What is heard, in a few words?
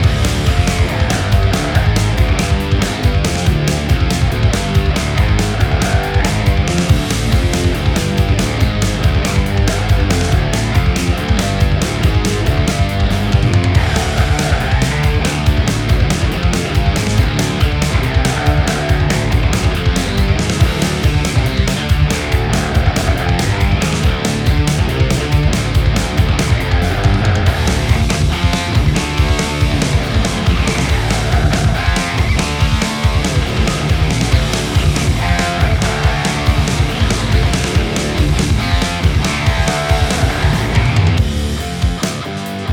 Boss Loop; Okunoka